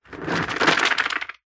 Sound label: foam bubbles bath squeeze squelch hand; household; zapsplat